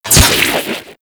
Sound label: Microwave Pistol Shot; Warframe; Grineer